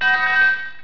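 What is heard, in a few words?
barrel drop; Digital Paintball 2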